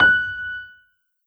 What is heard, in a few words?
piano